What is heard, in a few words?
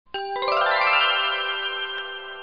correct